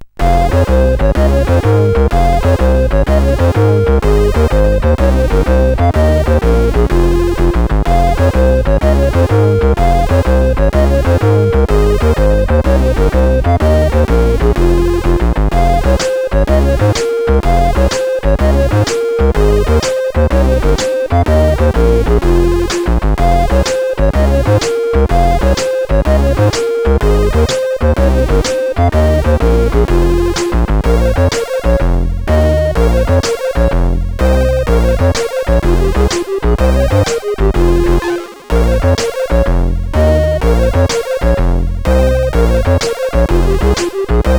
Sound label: Medieval Introtune, sid CSG8580R5